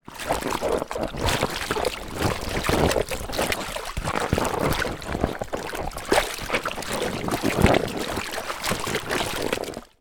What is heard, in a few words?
leisure inflated lilo movement water squeaks bird noise